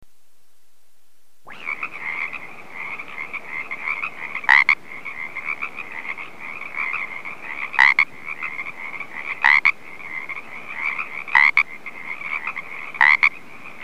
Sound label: Pregilla calls